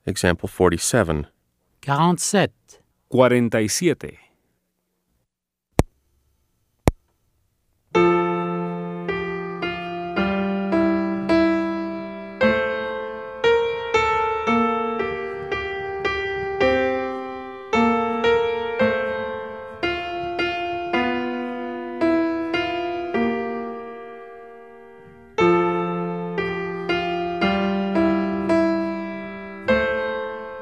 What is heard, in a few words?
Keyboard